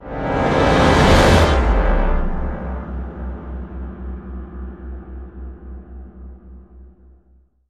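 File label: sting, horns; transformers war for cybertron gamerip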